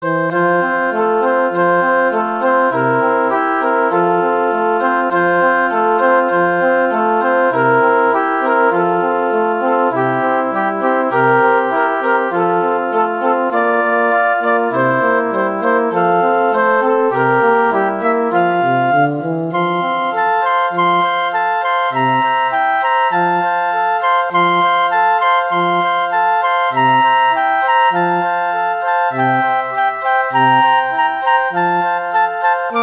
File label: O Come Little Children, Christmas Flute